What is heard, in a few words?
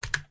click, keyboard